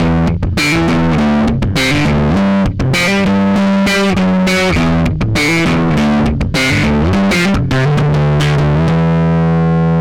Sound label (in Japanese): DIS スラップ